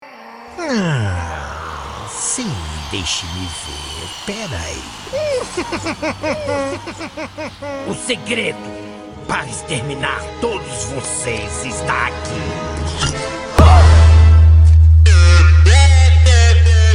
bass; bluster alto falantes

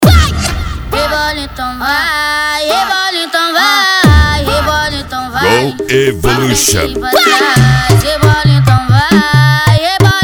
funk tumdumdum funk bass

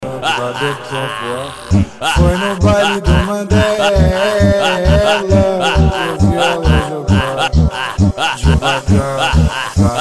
funk

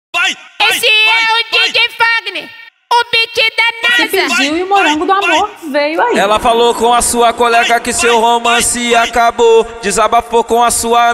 funk

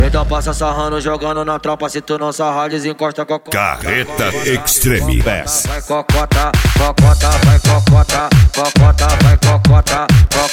extreme bass; carreta

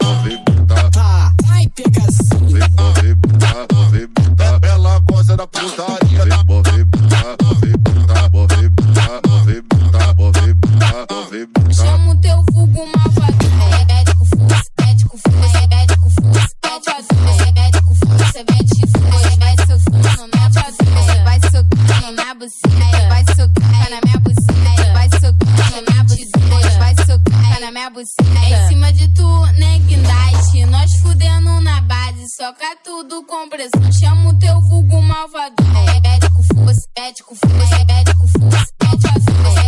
funk bass

